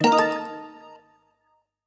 Button; Treasure of Nadia

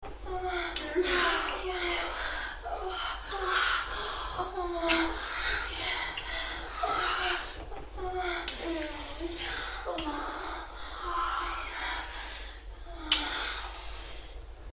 sex, moans; The Witcher Hunt v.1011

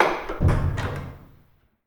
Door Church; Treasure of Nadia

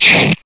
Slash; Treasure of Nadia

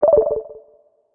Button; Treasure of Nadia

